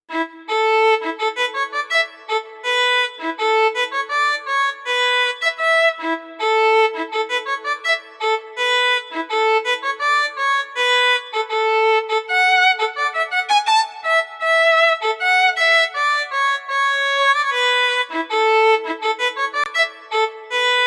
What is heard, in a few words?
Violin; VIVO S10